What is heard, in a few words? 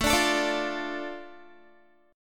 A Suspended 4th Sharp 5th down Guitar; Asus4#5; strummed